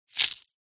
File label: animals insect cockroach crush squash crunch